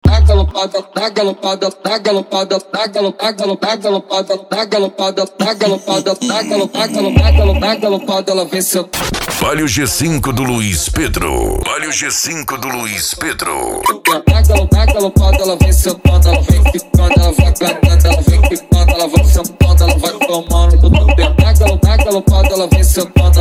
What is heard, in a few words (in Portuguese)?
funk mega bass e forro; urupa ro